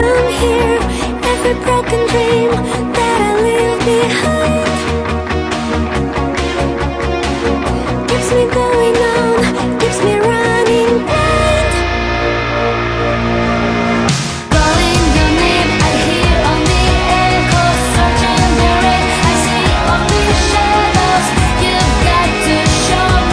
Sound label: remix